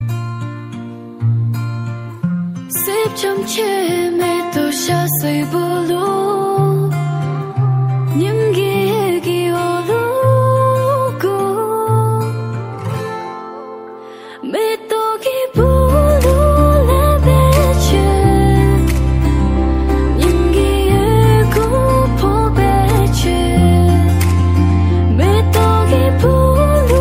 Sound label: bhutanese ringtone